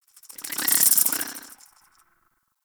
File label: Tree Critter; Ambience; Props; Warframe; TheNewWar; Zariman